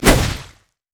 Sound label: pain; LoL; trundle